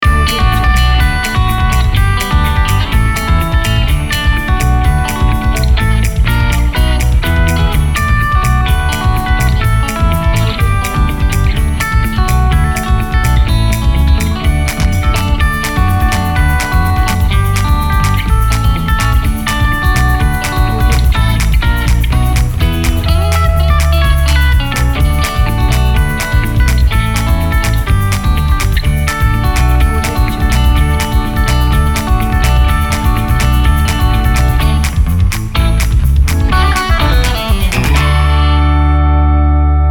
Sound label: guitar bliss